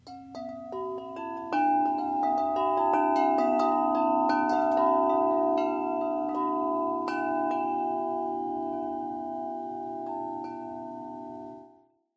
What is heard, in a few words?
62in, metal wind chimes; Textured Taupe series; Country Music